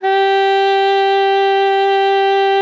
G4, saxophone; Best Service ProSamples vol.42 - Session Instruments

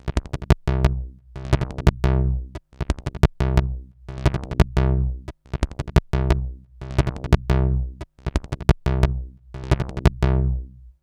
BASS, BASSLINES 175; Masterbits; Tekkno Trax

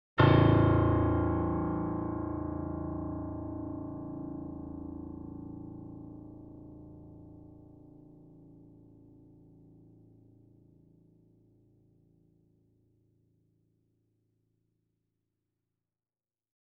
A0 Grand Piano & Dig Piano; Sound Sampling Collection VOL-3; Grand Piano 88

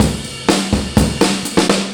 DRM 124, Breakbeat

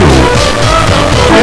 SEARCH LOOP, Big Beat; Big Beat Samples & More; AKAI; ALL LOOP KIT

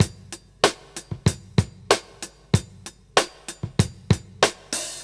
Todd 095; Zero-G; Monster Beats

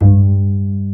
Bass, Roland

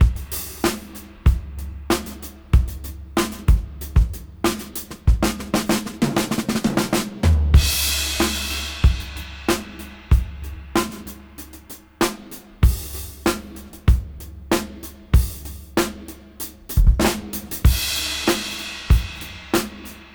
Funk 94 BPM; AMG - Keith LeBlanc Phat Butt Jams

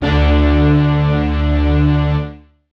Misc Stab

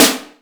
SD AMBI3, Korg Electribe EMX-1

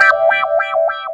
Clavinet; Ambient Grooves

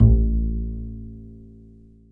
DBL BASS A1

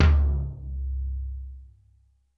KETTLE DRUM1, PERCUSSION

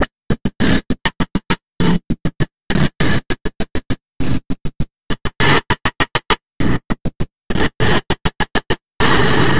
Envelope Beat; Drums & Drones

